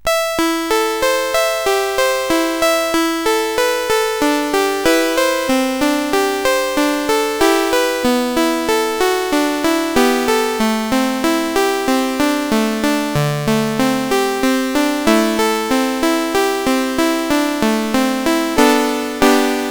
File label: music box, CSG8580; CSG8580R5; hvsc; Eight C64 Games in Six Minutes